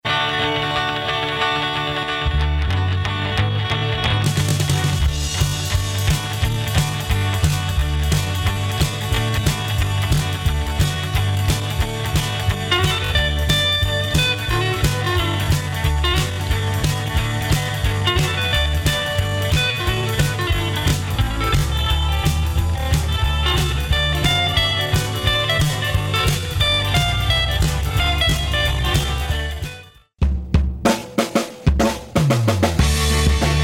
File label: rock